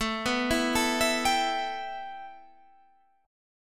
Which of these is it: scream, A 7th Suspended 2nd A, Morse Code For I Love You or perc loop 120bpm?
A 7th Suspended 2nd A